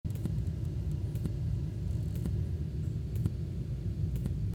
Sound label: click noise sample after graphic eq